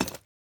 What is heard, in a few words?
ui formation